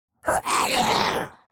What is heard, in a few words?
Demon beast imp monster sigh surprise